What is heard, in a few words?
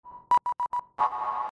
Sine wave